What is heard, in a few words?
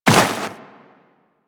Walk Snow, Footsteps; Warframe; Tenno